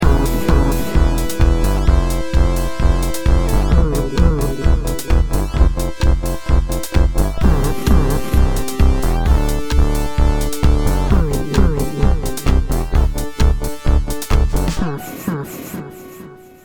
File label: Tighten Beat